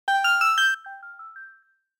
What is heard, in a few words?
Riddle Camera; SM3DL